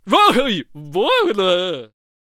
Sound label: Death Vocals